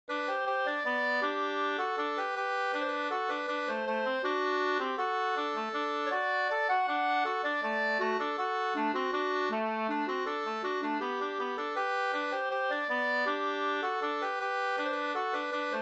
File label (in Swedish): ganglat G dur